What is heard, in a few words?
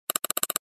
Computer mouse multiple click